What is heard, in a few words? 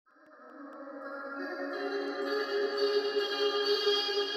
bugle